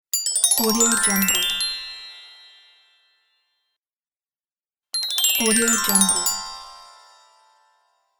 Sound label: jingle bells; soundfood